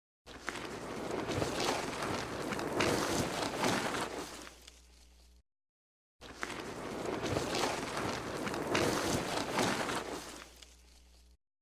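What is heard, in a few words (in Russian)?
звук прокручивания колес в грязи без звука двигателя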